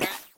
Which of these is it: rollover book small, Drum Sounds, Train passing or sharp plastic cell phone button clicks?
rollover book small